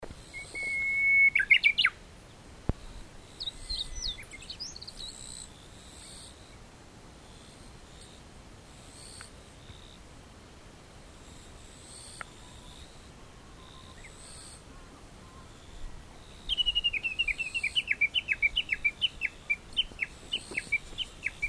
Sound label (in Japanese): uguisu kirigirusu